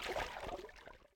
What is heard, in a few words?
Underwater - Swimming; RadwinIsland